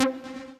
Synth Shot C; Mutekki Media; Vengeance Electroshock Vol.2

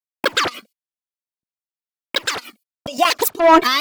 126 D VOCAL LOOP, Funky Underground; Loopmasters; Funky Underground Vol 2

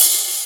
Open Hihat; Mutekki Media; Vengeance Electroshock Vol.2; VES2 Cymbals

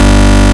Bass Shot G

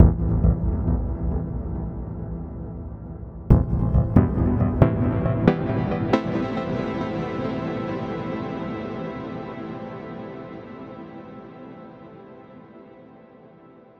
Bpm137 Chord Loop Am, Dubstep; Prime Loops; Dubstep Illusions; Airy Loops

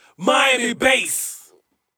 VOX; Loopmasters; Keith Mackenzie & Fixx Present Booty Breaks Vol.2

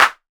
Clap, Dubstep One-Shot Drums

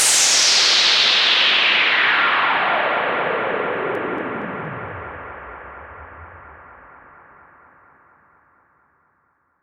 FX Downlifter

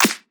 Claps & Snares

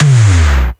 tom, One-Shot Drums

